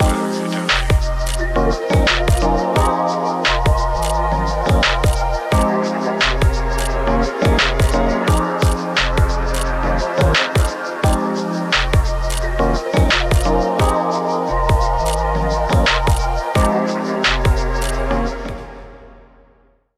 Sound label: Rhythmic Kathak Dance; yuttari